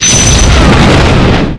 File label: WIND